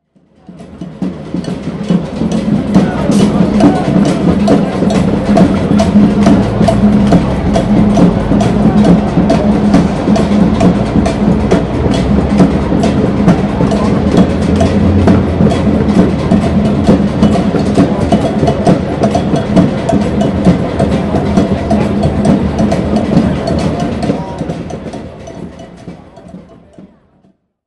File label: drums, Occupy Wall Street Drummers; Occupy Wall Street Drummers Generate Loud Debate; Occupy Wall Street drum circles in Manhattan's Zuccotti P